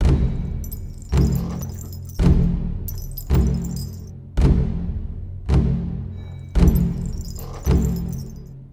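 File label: Voodoo Drum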